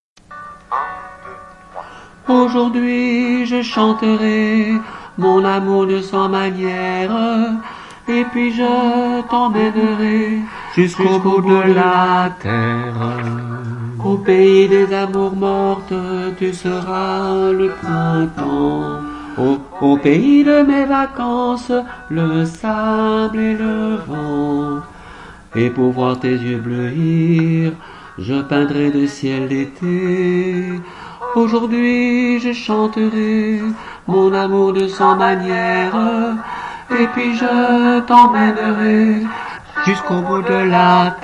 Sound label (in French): aujourd hui je chanterai basse; chorale